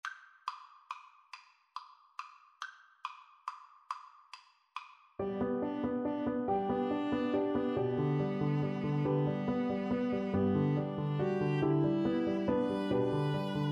VLN VLA, Violin Viola Duet; puccini beloved father

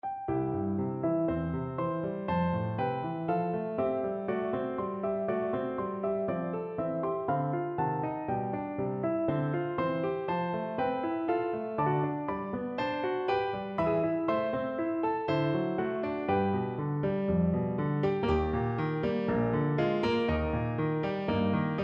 PNO

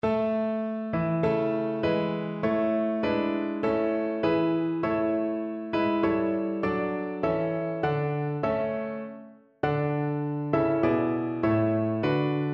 lithuania tautiska giesme, piano

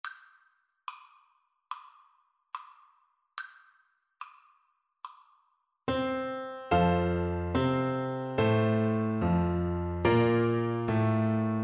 the internationale, Flute

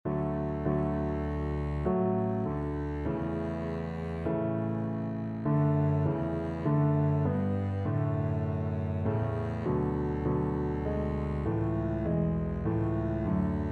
o come, double bass

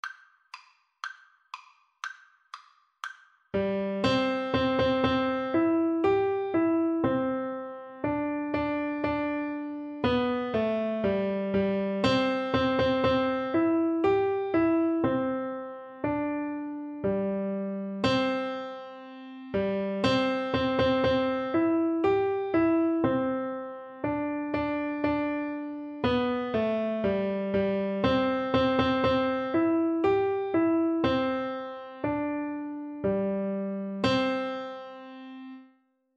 piano duet

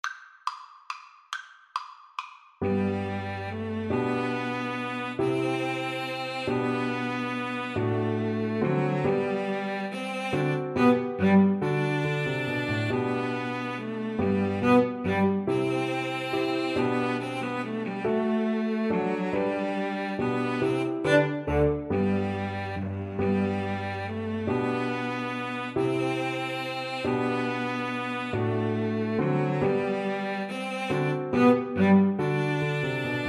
piano trio

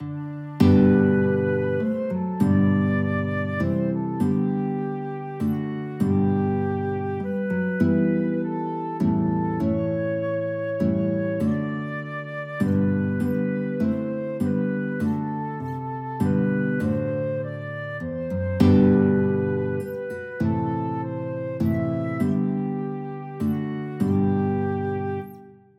guitar flute, guitar flute duet

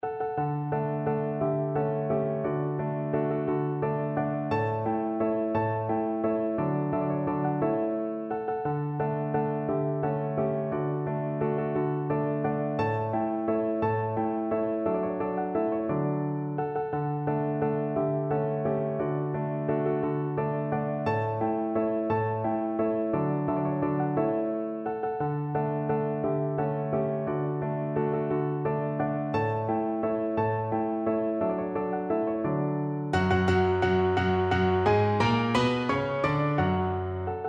german dance, piano

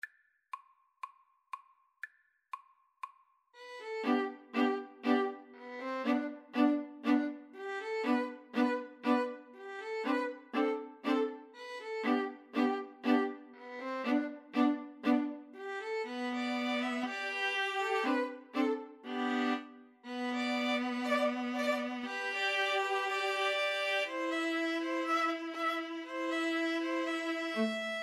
turkey in the straw, String trio

